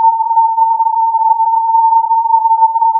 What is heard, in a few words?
filtered input